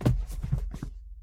body Fall; casualtiesunknown

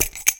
bear trap unlatch; casualtiesunknown

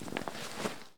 backpack; casualtiesunknown

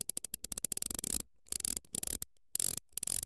drill pod repair; casualtiesunknown

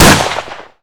gun rifle shot; casualtiesunknown

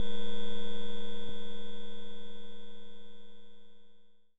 head hit; casualtiesunknown

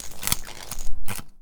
bone weld; casualtiesunknown

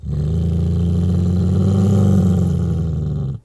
growl; casualtiesunknown

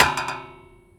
steel; casualtiesunknown

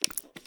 cream; casualtiesunknown

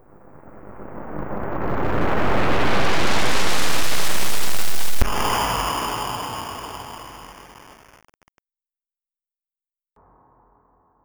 mind wipe; casualtiesunknown

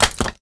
stalactite fall; casualtiesunknown

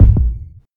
heart thump heavy; casualtiesunknown

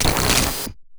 cut; casualtiesunknown

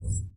menu Open; casualtiesunknown

